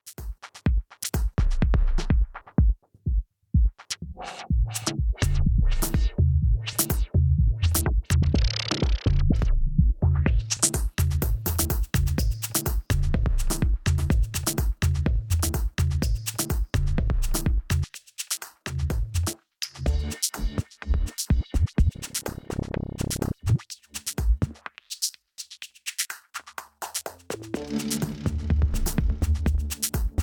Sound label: LFO; kp2mini